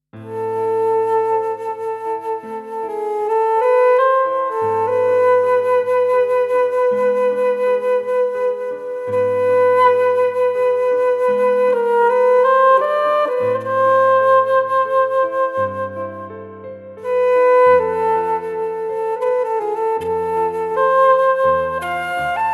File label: suite for flute flute